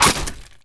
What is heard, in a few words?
plank small